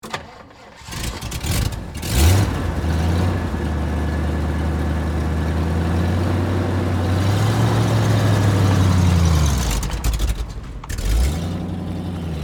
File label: Jeep Start Away, Automobile; Transportation; Jeep, Cherokee, Laredo, 1998, Exterior, Start, Up, Idle, Shut, Off; Car; BlastWave